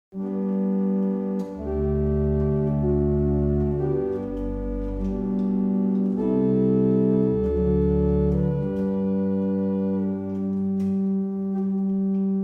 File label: Evening Hymn, barrel organs; Witton